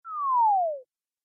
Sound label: Game Slow Fall; Video Game Sound Effects